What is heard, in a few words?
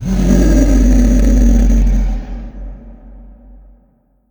coralriff cry; Crypt of the NecroDancer